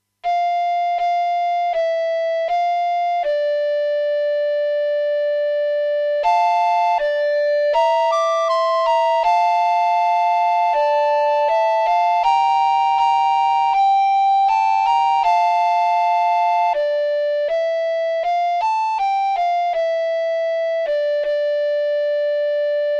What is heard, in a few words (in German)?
minischlaflied